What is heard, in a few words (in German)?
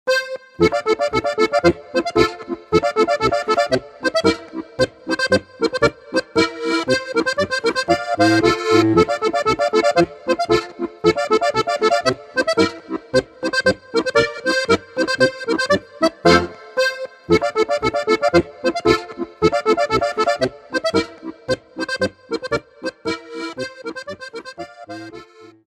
zillertalerisch boarisch